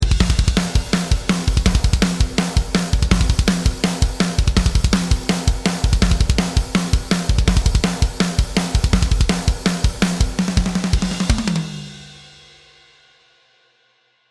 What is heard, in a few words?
Thrash Metal Groove 3 - Processed Dry, drums